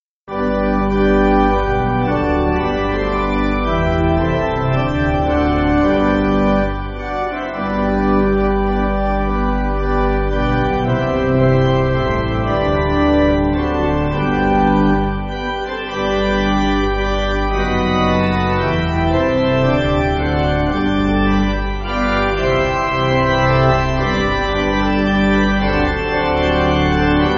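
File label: Pipe Organ, Music Backing; All Hail The Power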